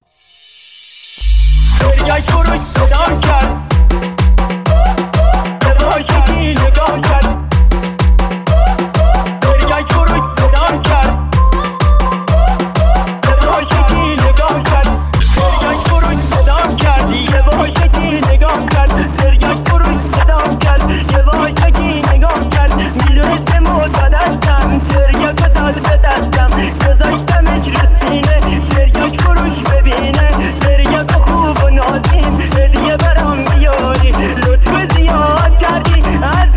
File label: Remix